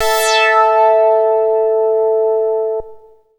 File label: MASS LEAD, SYNTH; Keith Emerson Signature Series